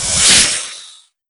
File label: Spider Attack